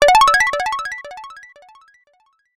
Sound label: app alert tone